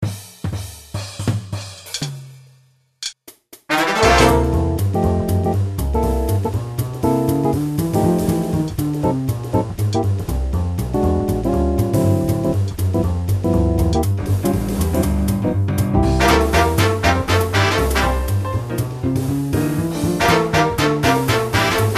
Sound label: Jazz; Idecs Music Software